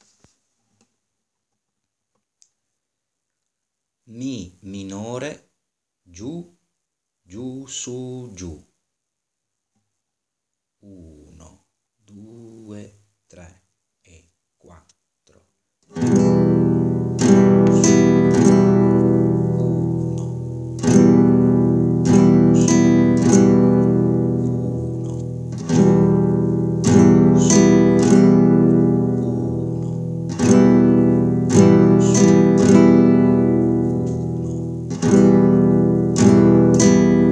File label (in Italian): ritmo con mi minore